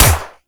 Harpoon Gun Shot; Warframe; Sharkwing; Grineer Harpoon Gun

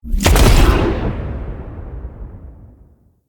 Iron Blast Cast Fully Charged; Warframes

